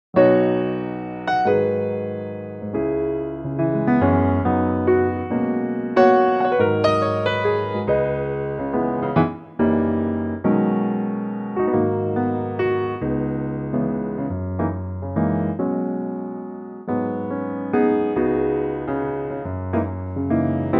piano B; key - B - vocal range - G# to B; Wonderful Italian gem that was used in the movie "The Flight Of The Phoenix"

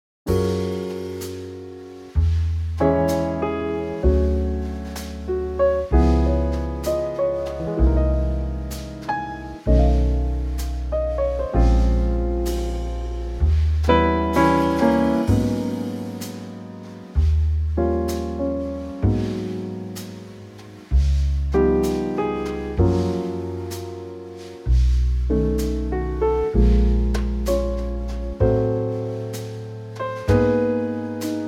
Trio Gb

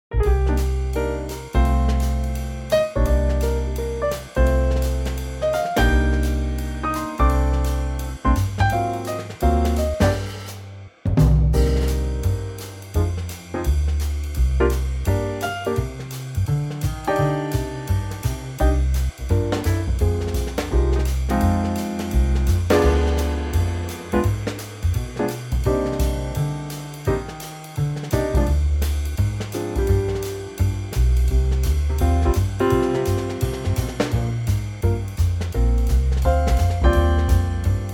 Trio; Jazzville

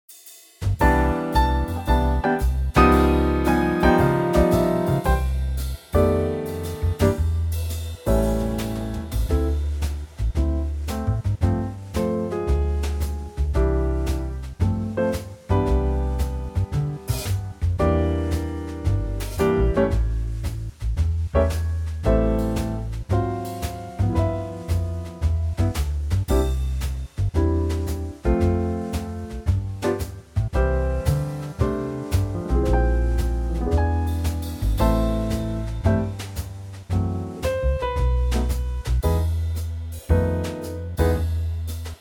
C trio

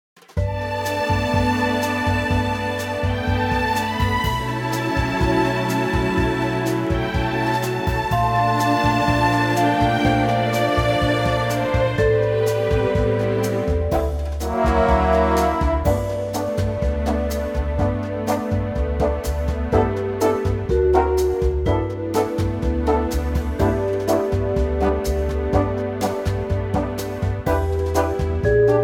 G orchestral; key - G - vocal range - G to A; Here's a lovely orchestral latin arrangement of this classic American standard; A nice laidback tempo

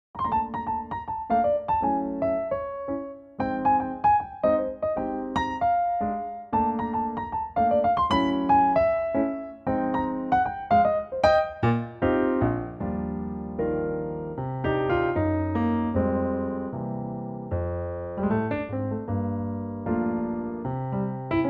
Eb piano; 2018 Pianotrak; key - Eb - range - Eb to Eb; Wonderful piano only arrangement, stays in tempo all the way

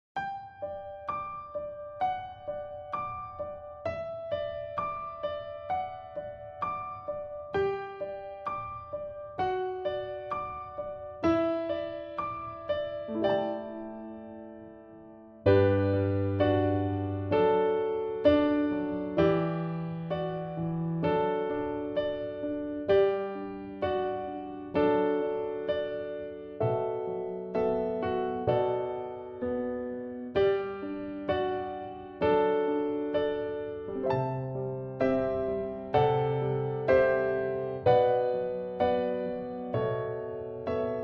D piano; key - D; piano only arrangement

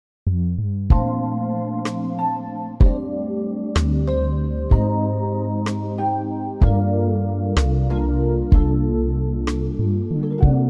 Bb smooth; key - Bb - vocal range - C to Eb; Smooth sounds and cool chord changes

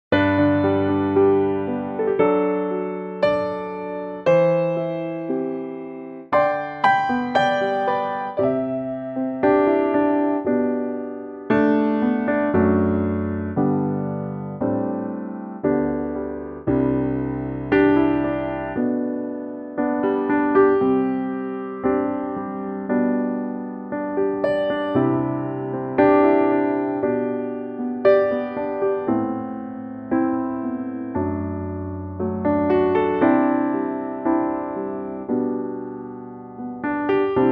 G piano

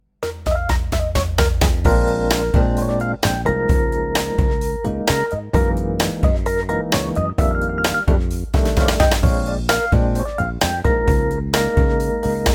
Bb funky; key - Bb - vocal range - A to C

